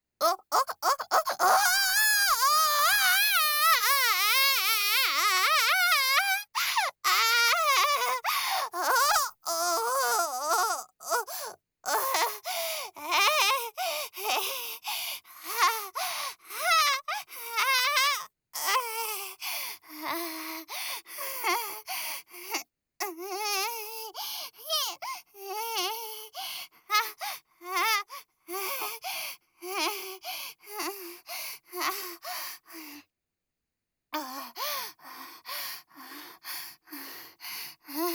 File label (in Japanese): 絶頂（SEなし）高潮